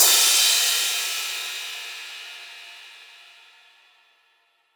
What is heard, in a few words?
Crash Layered, Cymbal; One Shots; Drums; Ableton Live 12 Lite; Core Library